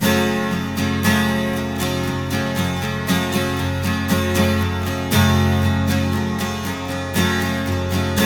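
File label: 12 string minor G, guitars; Spectrasonics; Hans Zimmer Guitars VOL-1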